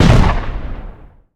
enemy Fire; rage of the seas

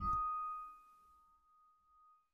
right Miror; hiddencoinsofegypt

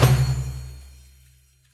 spingle Drum End; wild bazaar

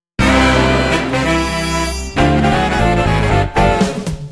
cash stack intro sequence music; pork knox

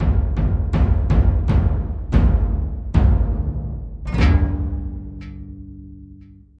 outro free spin; king of 3 kingdoms

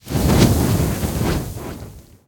fire Burst; narcos

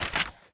mini Paytable Hidden; imperialriches

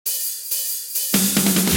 reel Spingle Start

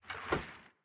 old Line Falls; cashomatic

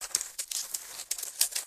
monkey Move; rage of the seas

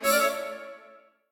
attention Free Spin; robin hood

